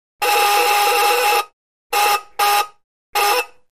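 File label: Door Buzzer Electric, doorbells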